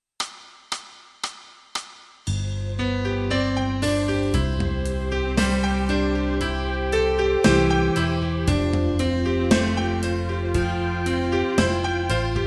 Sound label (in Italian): Danny boy Ascolto piano tutti